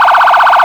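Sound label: emergency vehicle phaser, sirens; feniex storm pro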